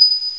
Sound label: COIN16 A; CD Action